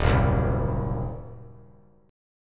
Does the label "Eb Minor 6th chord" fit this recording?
Yes